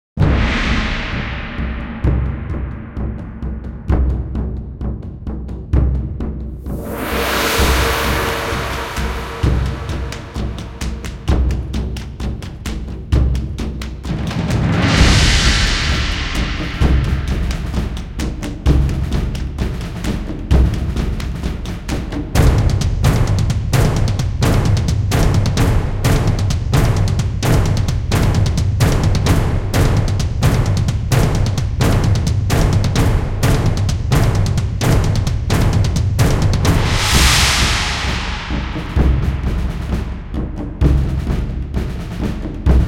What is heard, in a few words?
Percu; RaidBtle; GAME; UniqueNote